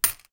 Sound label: coin